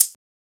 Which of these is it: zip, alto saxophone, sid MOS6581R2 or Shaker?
Shaker